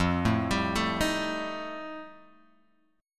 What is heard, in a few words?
F 9th F Guitar Open G, chord